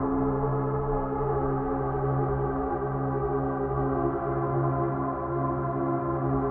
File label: electro